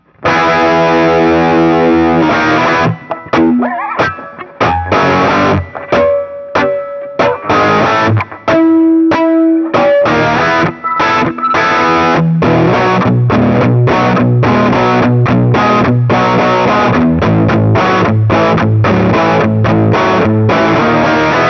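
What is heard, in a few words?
Guild SF III Super Tweed, guitar; Guild Starfire III; 1966 Guild Starfire III; SuperTweed / Rocky Horror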